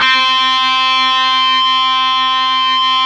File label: GTR, Dirty Buzz; Club-50 - Foundations Roland